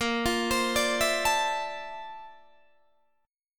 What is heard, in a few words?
Bb Major Sharp 11th Bb down Guitar DADGAD, chord